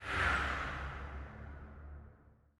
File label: Landing Engines